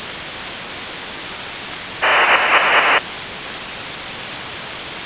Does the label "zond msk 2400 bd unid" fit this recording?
Yes